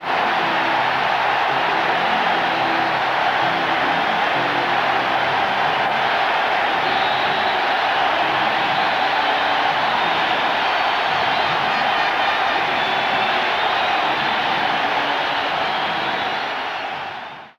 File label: Baseball Crowd Cheer Organ; Crowds